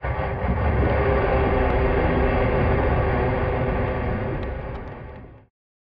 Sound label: camera win game; Grim Tales: The Legacy